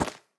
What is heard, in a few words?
block coal destroy